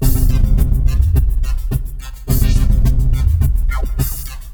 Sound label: Processed Beat, Drums & Percussion